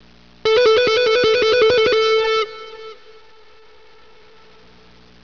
elec guitar

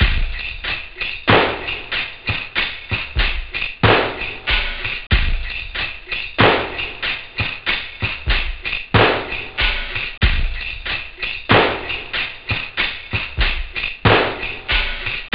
basic, house; OctaMED SoundStudio V1

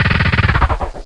drill

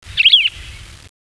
jungle; Renaissance

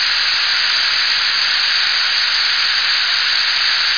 air; X-men; ambience

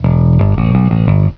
end Phase Music; F.A.R.M. Patrol

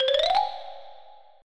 BOOK OPEN; Sierra; KQ 6

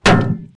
met strk, bugs; Starship Troopers

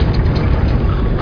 hvy gate; outlaws

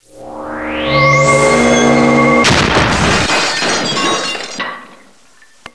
glass brk; XMEN2

